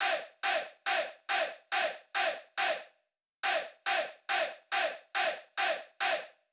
drum Loop 140BPM Vox Ey; Vengeance - Essential Clubsounds Vol.5; VEC5 Drumloops; VEC5 Multi Loops 140BPM

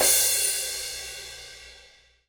Crash; Equipped Music; Loungin House

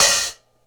Vintage Pinched Hi-Hat; KJ Sawka; Drum n Bass & Beyond; Drum Tool Box

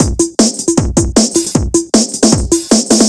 155 Dancehall Fill, Drum Loops; Zero-G; Soundclash Nu Dance Electro; Acidized Wavs; 155bpm; Dancehall Fix

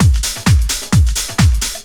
drum 130 dance, drumloops; Techno Trance Essentials

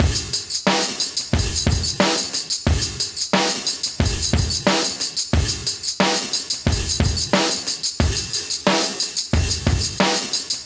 90 Shaker Breaker, breaks, Live Breaks

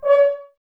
Woodwind Brass Ensemble; Miroslav Vitous

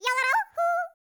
YODEL, World Voices; ILIO; Vocal Planet VOL-5; BAVA. YODEL

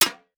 METAL SNARE MINI

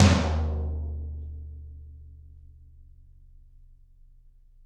Toms, Drums; Bob Clearmountain Drums I

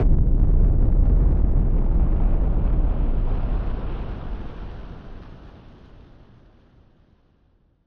Noise Bomb Rumbler; musicradar; bomb factory

